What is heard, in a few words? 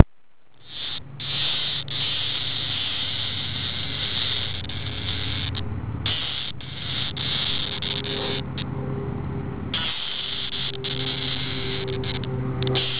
lyr squawk, Cicada